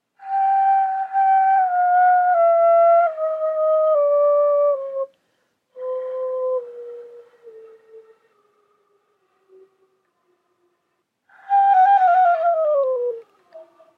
flute embouchure

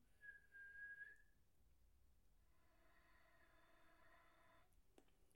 whistle position breathe in

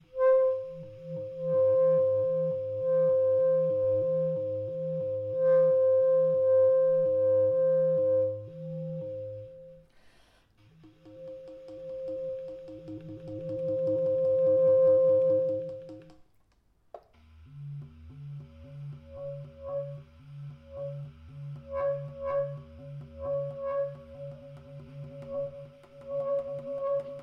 variable speeds

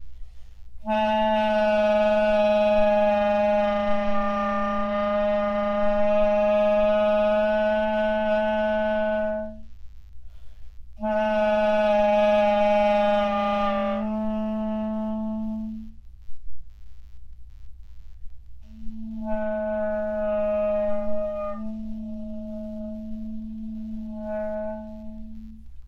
two slow bends

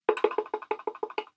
key clicks c sharp without

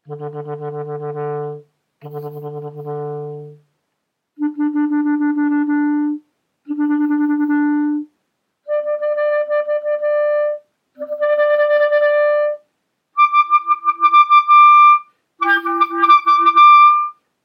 single versus double tonguing

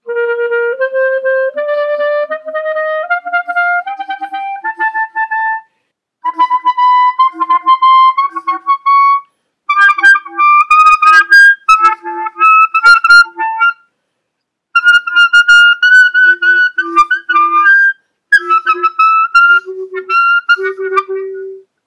doubletongue disintigration in high register

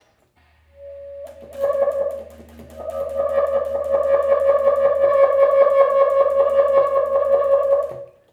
trilling

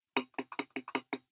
key clicks d with

